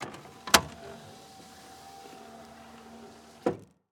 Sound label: Semi Truck Window; Large Vehicles; Blastwave